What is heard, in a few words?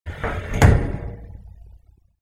open cube door; through abandoned the underground city